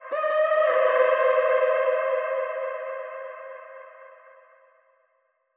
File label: Moonlight Mystery Vocal